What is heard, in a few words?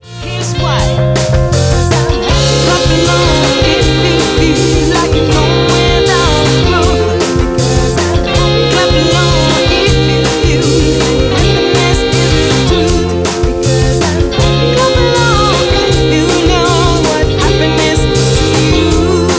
happy